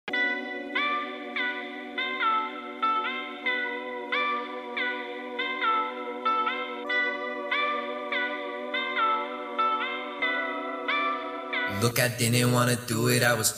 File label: Zombies rap